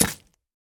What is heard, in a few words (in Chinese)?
南瓜雕刻声